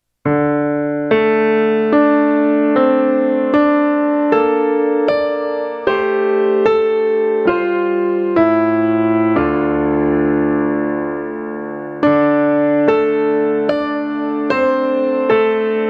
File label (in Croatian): uskrsnu isus doista instrumental